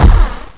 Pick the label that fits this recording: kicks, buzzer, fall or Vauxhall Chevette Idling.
fall